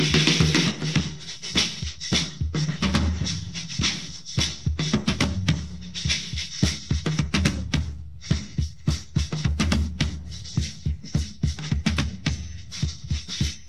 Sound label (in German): Drums